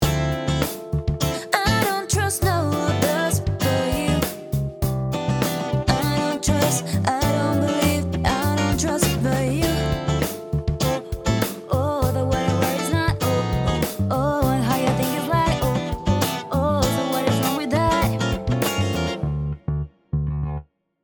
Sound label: Variax Acg